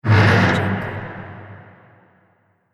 haunted house door creak

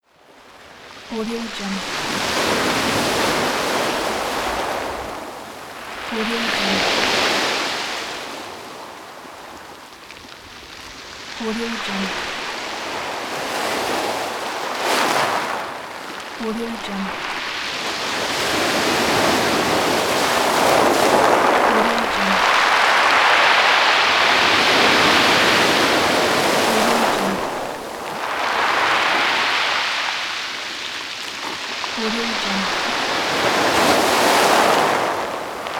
waves on pebble beach

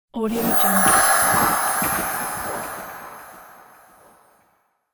dark shine spell whoosh

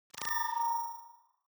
ui sci fi hint

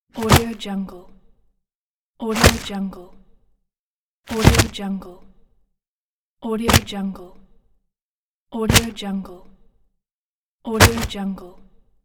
dropping stack of papers

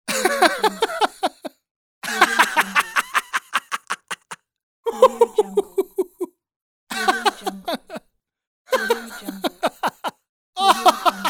man short laugh pack

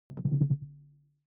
ui sci fi alien tech dull

